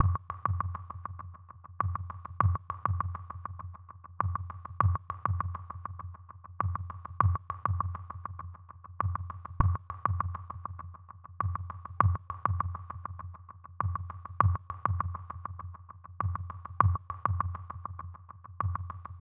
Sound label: Percussion